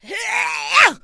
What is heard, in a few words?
attack, elven female